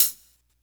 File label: small reverb pedal hi hat single hit g sharp key